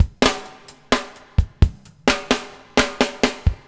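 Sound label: BUBBLE left; Spectrasonics - Backbeat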